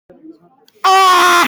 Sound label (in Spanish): grito desesperado